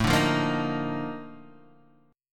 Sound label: Bb Major 11th A